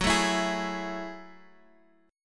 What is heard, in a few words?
Gb Minor 9th Gb Guitar Half Step Down